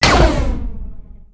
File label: fire, weapons; Portal 2; wheatley